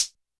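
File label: Hi-Hat Open Analog Short; YAMAHA RM1X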